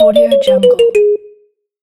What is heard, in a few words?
game over